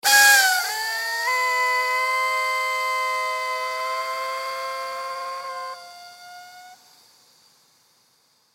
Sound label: Fire Oxygen Tank System Air Release Slow; Emergency